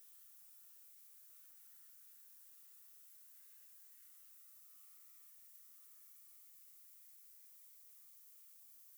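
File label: Eptesicus nilssonii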